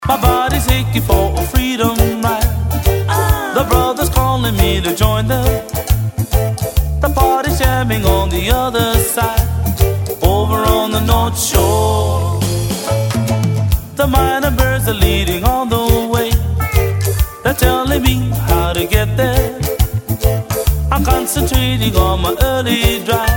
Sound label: North Shore Reggae Blues